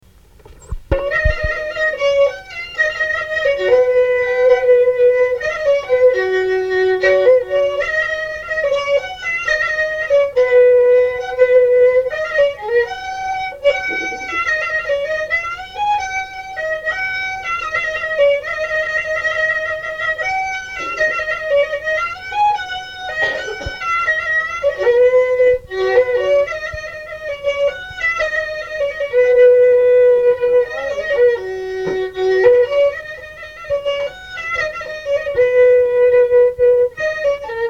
valse; Turlututu

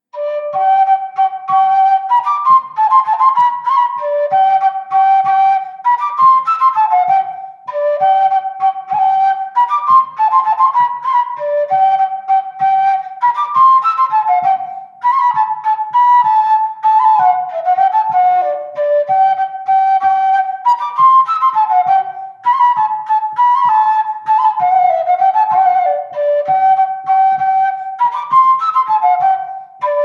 Montagnarde

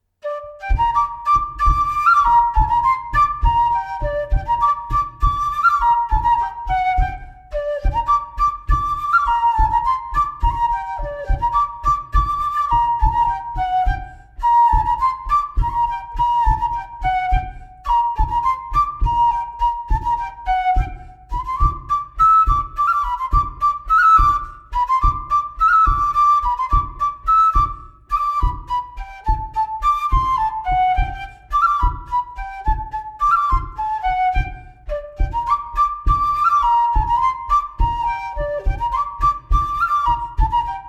bourree Chanson auvergnate; Coatrieux

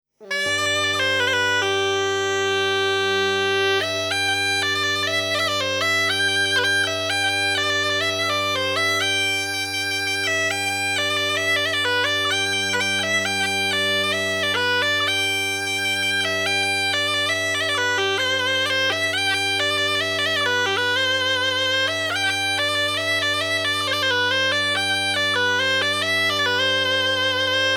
Air de noces; Air de noces de Pierrisson

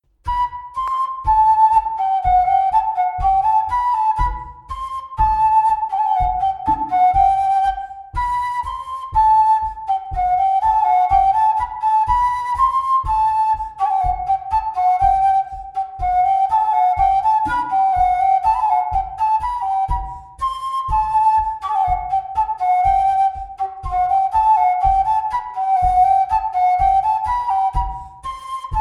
Bourree; Recueil De Raoulx

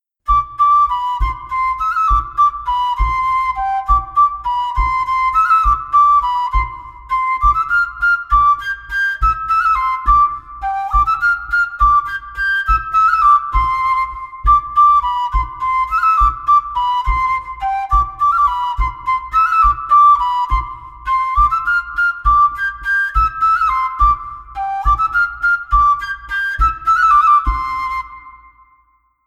Montagnarde

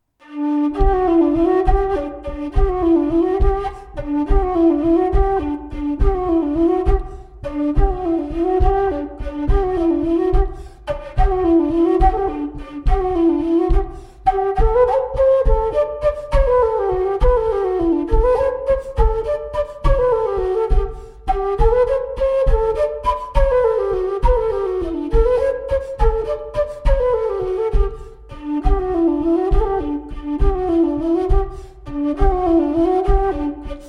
Montagnarde; Recueil De Raoulx

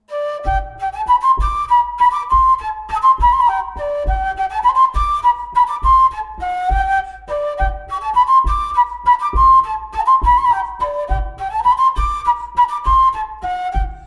Bourree parisienne montagnarde

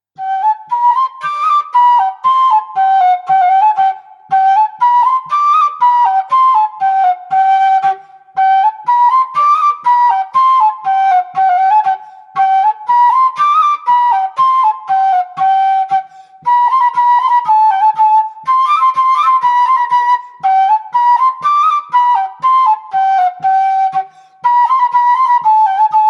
Rigaudon d'Auvergne

